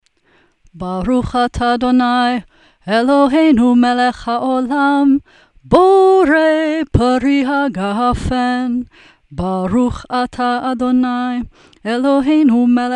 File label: Kiddush sung; Bnai Mitzvah Requirements; Service Practice